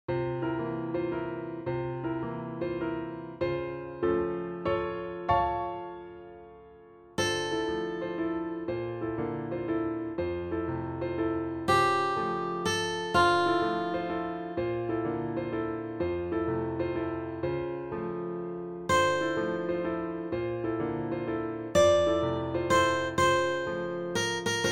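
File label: accompaniment melody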